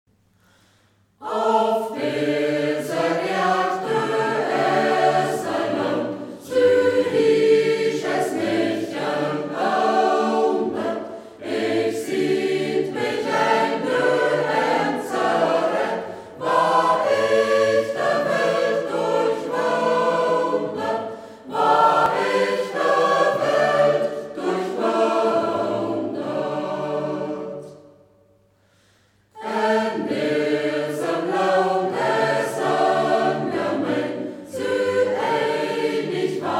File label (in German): chor; Ortsmundart: Zied; Af deser Iërd; Zieder Chor; Hegt wird gesangen